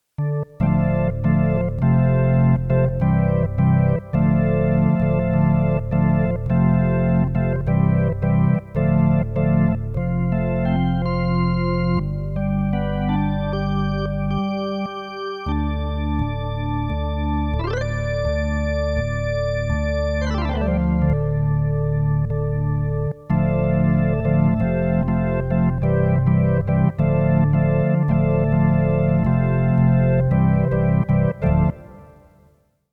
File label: electric